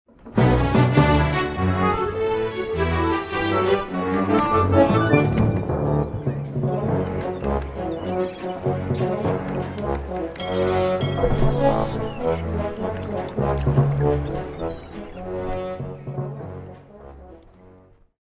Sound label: Winning a Sword Fight; monkey island 3